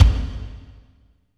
KICK, Tekno Industrial; East West

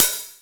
Hat Open; Xtreme Lead-1; Digital Sound Factory Xtreme Lead

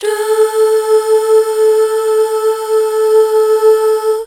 DUUH FM L, Hallelujah, BACKGROUND

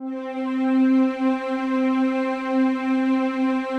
digi pad, Pads

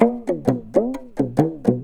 PAPAN, Indian Traditions

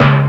TIMBAL; TIMBALS; Ragga & Dub Reggae

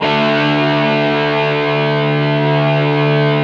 GTR D MAJ, ROCK GUITAR; Greytsounds VOL-1; ROCK GUITAR2

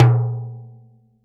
L-TIMBA OP, TIMBALES

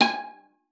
G#5, VIOLA FINGER; Xsample VOL-5

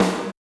RX8 SD, Yamaha RX-8

